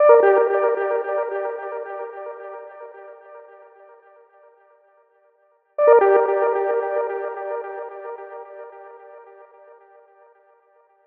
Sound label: Synth Pluck Gm 166